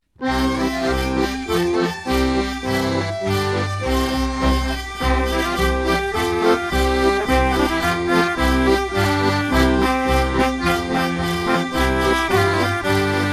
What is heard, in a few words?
Klapsprong